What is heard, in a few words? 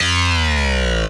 RAVE, RAVESYN